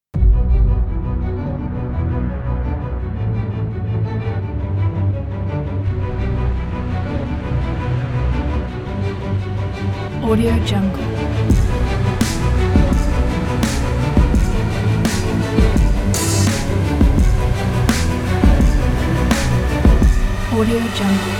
epic violin hip hop

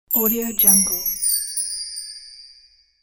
triple descending chimes

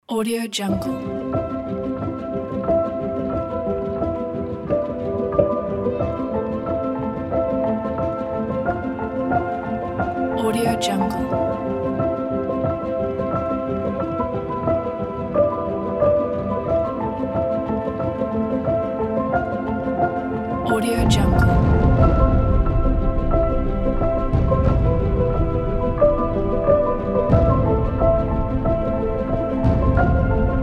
beautiful piano with inspiring strings

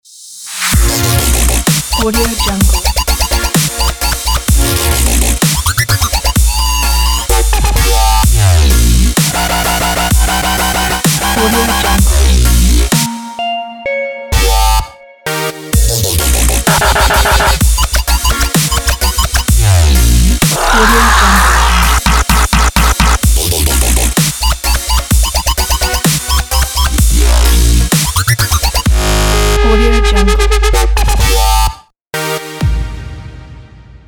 dance dubstep

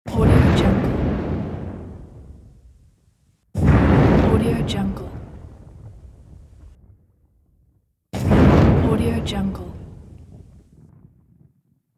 catch fire set

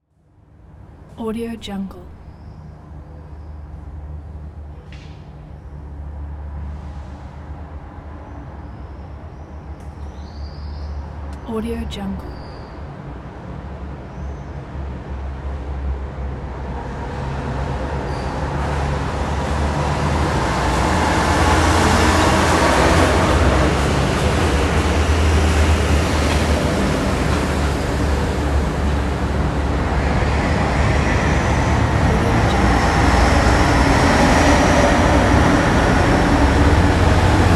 city traffic

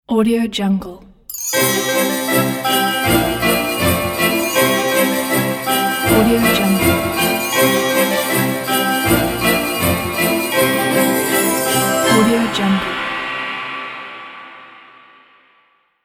christmas joy ident

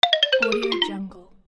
down

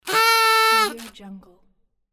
party blower

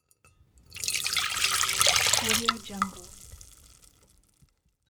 pouring tea

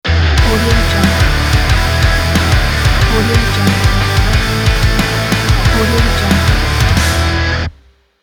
rock intro logo

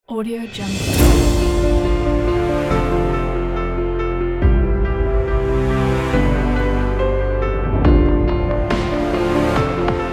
cinematic dubstep

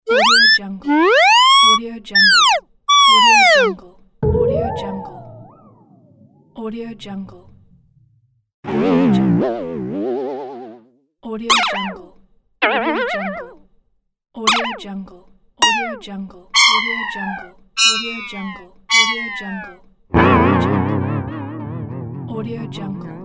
comedy and cartoons up and down whirls pack; m-i-r-k-i-c

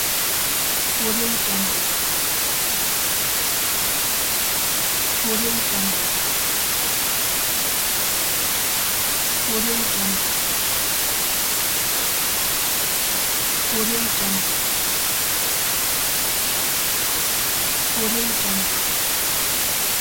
white noise

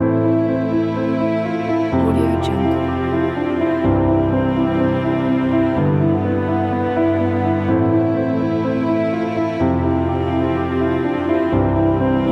score cinematic

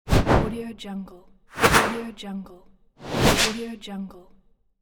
double whoosh

